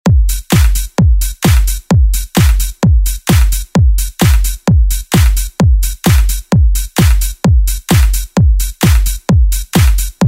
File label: Party Hype; Deejay Hit; Redrum